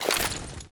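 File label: incendiary grenade throw; arccw go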